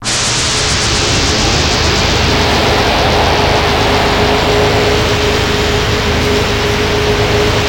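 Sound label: G#3 noise; Northstar; The Wizard VOL-1; MYSTERY BOX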